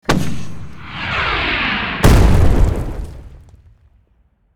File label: Mortar strike launch and impact